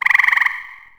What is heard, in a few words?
Bird